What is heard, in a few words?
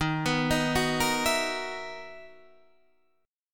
B Major 11th Eb, guitar